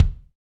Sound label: BD, BD REAL